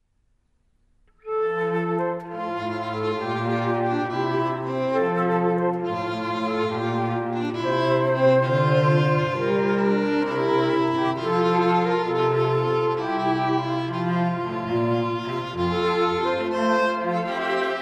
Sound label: Flute; Air From Water Music